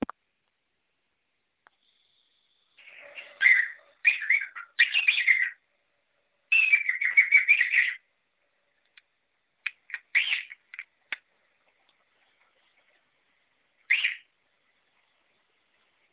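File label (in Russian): popugaj korella golos, cockatiel parrot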